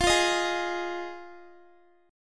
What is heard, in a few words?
F Minor 7th, down Guitar; Fm7; strummed